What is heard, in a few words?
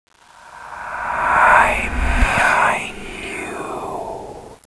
zombie idle